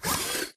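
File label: Tick Movement Servo Walk; Titanfall 2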